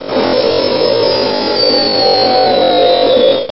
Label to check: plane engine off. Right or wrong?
Right